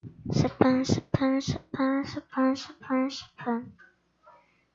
spoon

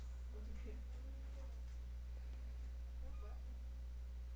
register

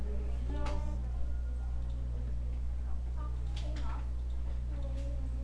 shake

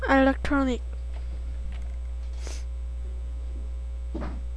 electronic

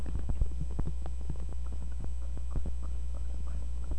bowl

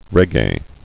reggae

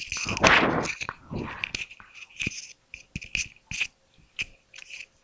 owl

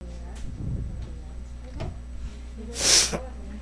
metal

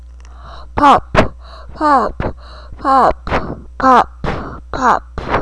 pop

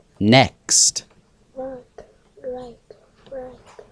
rake

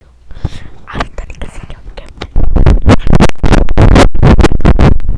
fire

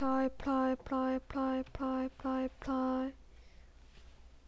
fry

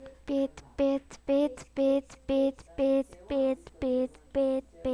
beat

